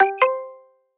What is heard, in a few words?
msg notify